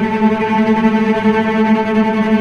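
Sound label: cello A3 tremolo; East West; The Ultimate String Collection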